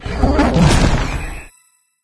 Legend Mantikora Corupt Die

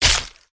hydra attack contact

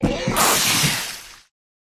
Alien

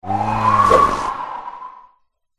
snowman attack voice up

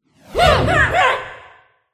attack voice middle

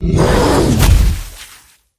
troll die

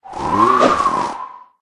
snowman attack voice bottom

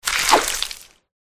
crab attack voice middle

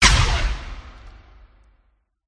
mage student attack contact bottom

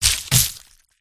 cat attack contact middle

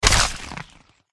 tiger attack contact middle

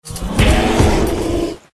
Bear

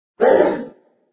fire wound; krofdor

